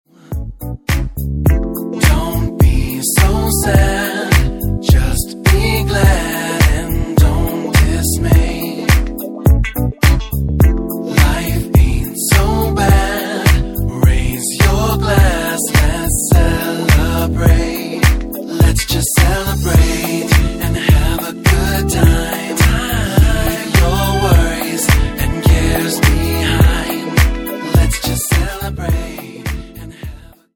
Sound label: Celebrate, soul